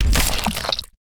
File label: minion death; Grimrush